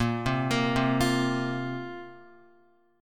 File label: Bb Suspended 2nd, Guitar; arpeggiated; chordshape